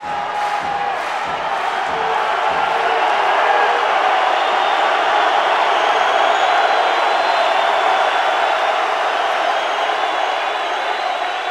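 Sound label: Basketball Crowd Cheer; SFX Kit; Crowds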